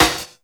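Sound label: snare drum, drum & bass; Ueberschall; Drum & Bass Resonance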